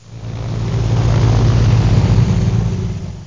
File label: menu popup; ARMYMEN